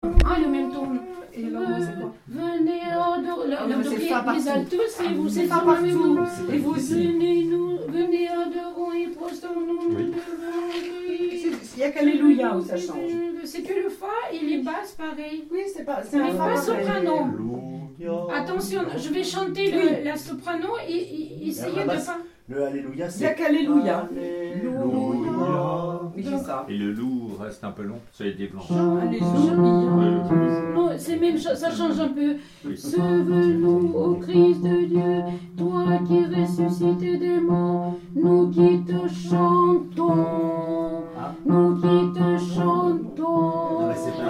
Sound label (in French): Venez adorons basse